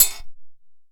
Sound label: BULU SINGLE; Best Service; World Colours